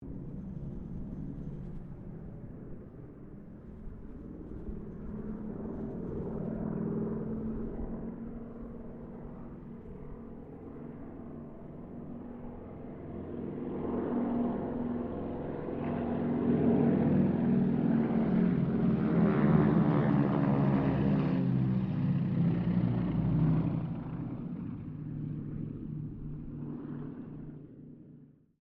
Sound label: AIRCRAFT HARVARD SQUADRON 3 PASS DISTANT; Mixed aircraft squadrons; Airplanes; Vehicles